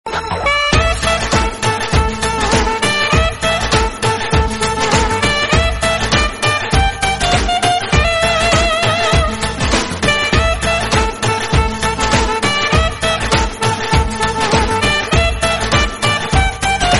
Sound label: Hindi Tone Ringtone